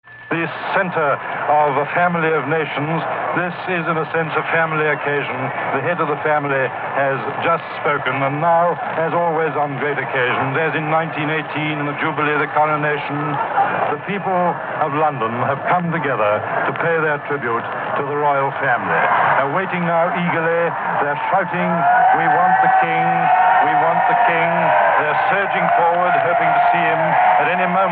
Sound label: UK Royal Family Greets Crowd VE Day